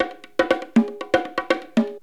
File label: BONGO 119, PERCUS LOOPS